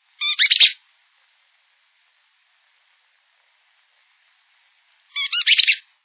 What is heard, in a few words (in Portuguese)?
bagageiro